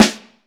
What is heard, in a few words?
Vintage Snare Drum; 3.5X14; Q Up Arts; Johnny.C's Vintage Snare Drum